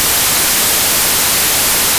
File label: White Noise